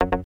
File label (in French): erreur; sante-social